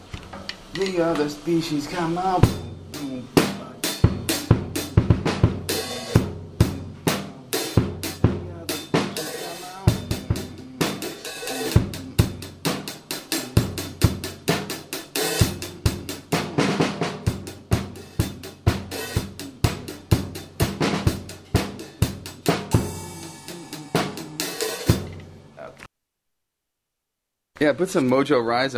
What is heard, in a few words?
drums